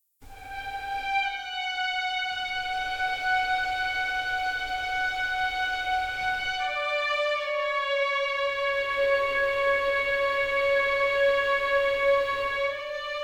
Ambient, Strings Stem; ps4 ps5 switch windows xbox one xbox series xs

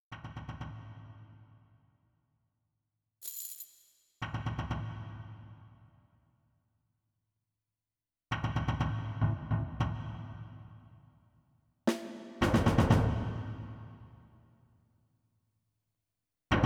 Suspense; Stronghold Definitive Edition

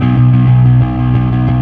Fuzz B3; Torchlight III; PS4

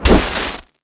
candle; hamster race; birthday party bash wii gamerip 2009

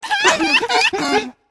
Pigmies Mourn; Character Pigmies Mourn

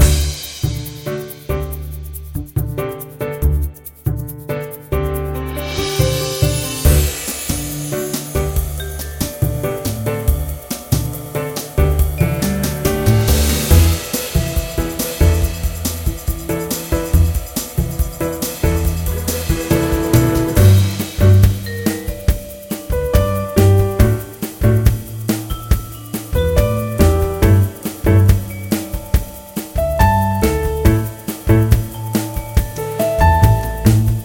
Comic music; hotel dash 2 lost luxuries

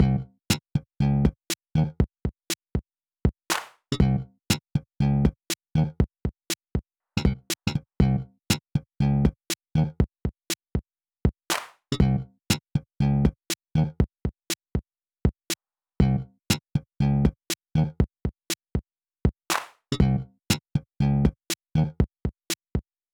BGM rhythm; Game Builder Garage

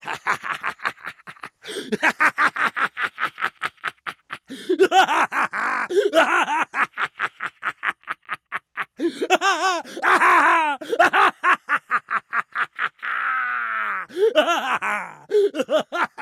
Attack grunt; We Happy Few

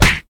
Punch Impact; Prison Architect

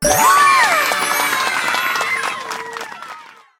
bubble welcome heart; game rip; line bubble

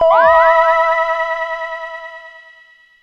stinger success; Jettomero Hero of the Universe; PS4

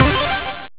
Confirmation; NBA Smash

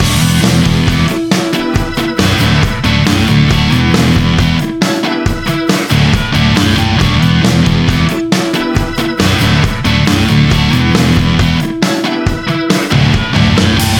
reveal music, Music Room; Spanish

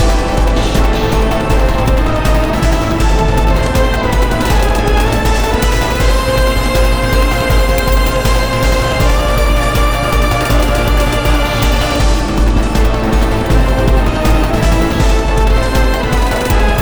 Steelworks Fast; rive wreck hack die retry; ps4

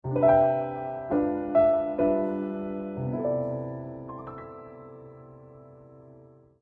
ui music; Archeland

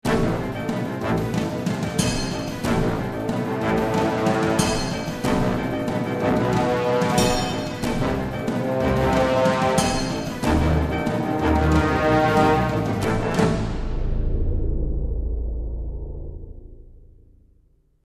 Action

